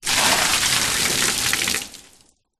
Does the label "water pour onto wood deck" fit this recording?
Yes